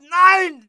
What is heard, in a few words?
hit, pain; mohaa